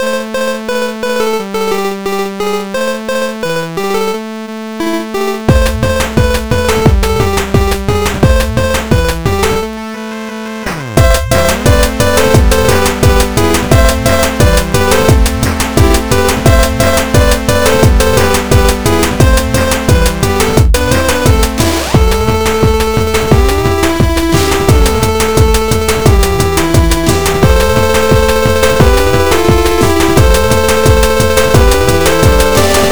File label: song